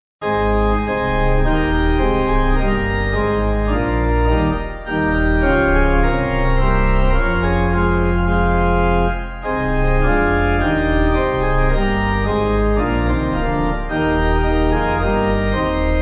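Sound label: Pipe, hymn backing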